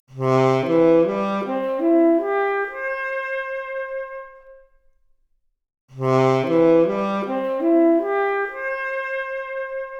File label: Saxophone arp, mp Stereo output noised, 4bit